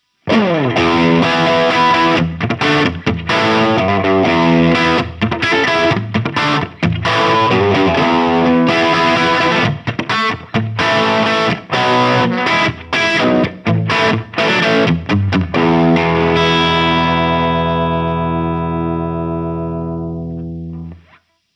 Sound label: Humbucker Brilliant; Sound City Master One Hundred